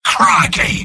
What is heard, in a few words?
sniper negative vocalization; tf; mvm